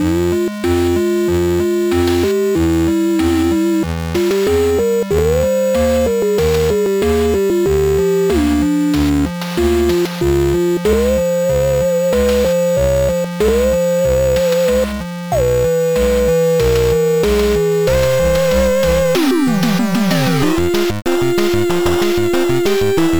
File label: Chiptune